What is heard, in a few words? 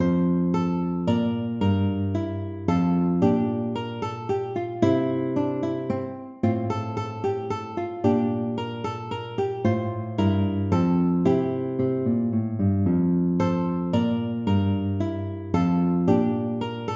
minuet major